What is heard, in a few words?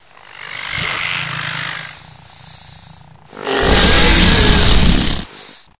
growl, antlion; hl2